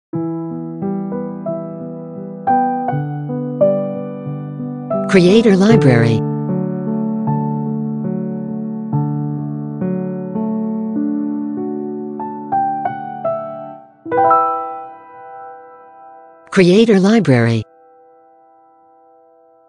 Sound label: Piano Logo; Logo-Idents